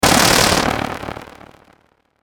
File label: Hit Electrical, Hit Electrical Glitch; Hit Electrical Glitch Low; Electrical Button; Blastwave